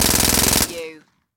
Submachine Gun Uzi 9mm Long Burst Close Perspective